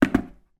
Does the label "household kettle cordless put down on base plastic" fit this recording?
Yes